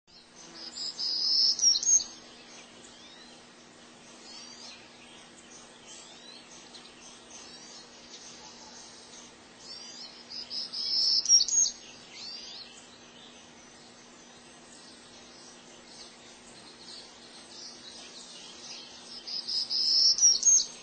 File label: Townsend's Warbler; Birds